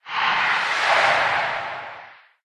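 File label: necromancer die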